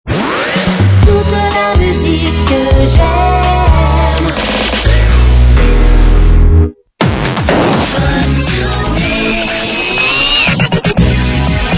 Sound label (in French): JINGLES CHANTES